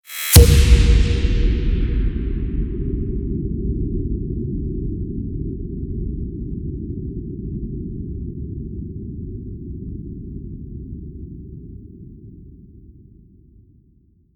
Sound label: Drilling Implosion; Plastic Surgery